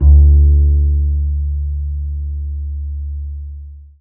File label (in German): KONTRABASS D; Alpine Volksmusik